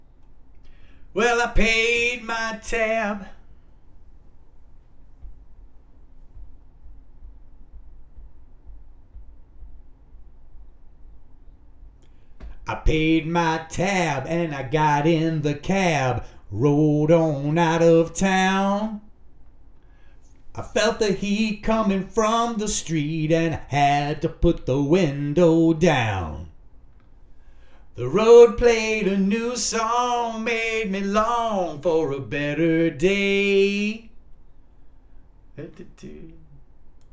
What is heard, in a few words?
vocals